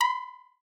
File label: Countdown